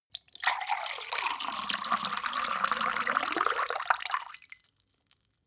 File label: glass pour hard; soundpool